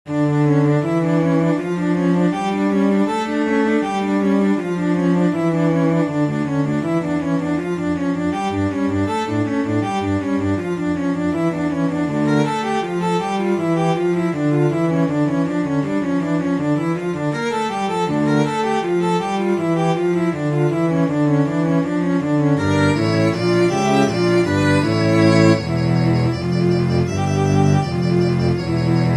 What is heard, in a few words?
battle